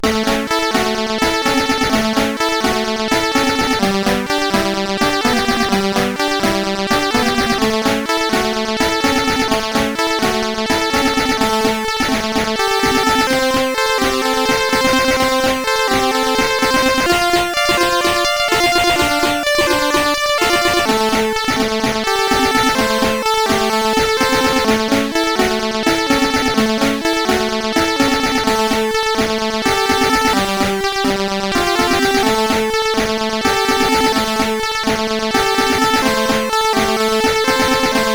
Little Song, CSG8580R5; Little Song 6

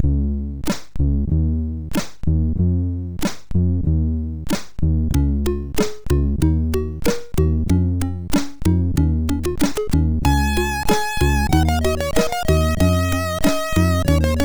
End Music MOS6581R4

